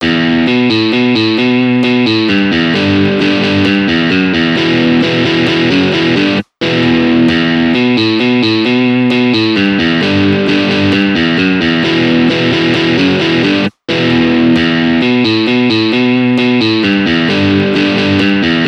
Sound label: guitar riffs